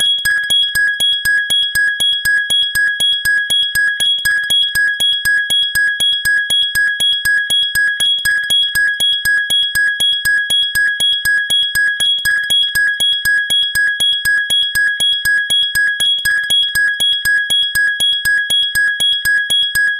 Noises, ringtones; GW620; LG

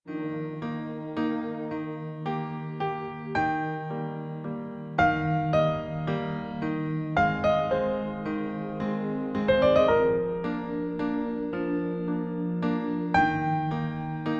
Waltz Piano, alarms; Infinix; NOTE 30

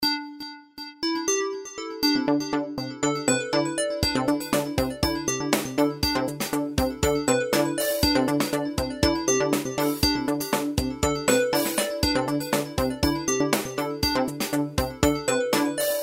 Style, ringtones; Okapia; Spark

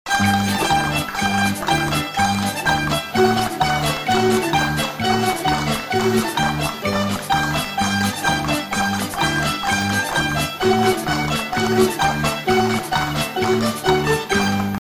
Filipino Folk, ringtones

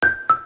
Marimba

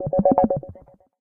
Radio Echo, notifications; Honor; MagicOS 7.1

